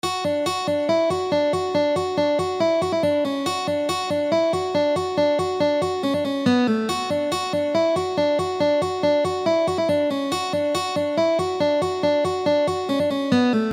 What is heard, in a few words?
riff